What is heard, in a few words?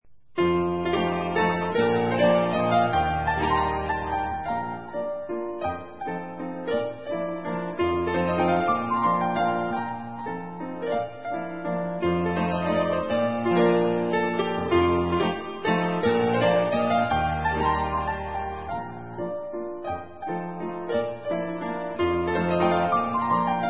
mazurka, fortepianos